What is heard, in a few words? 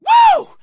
woo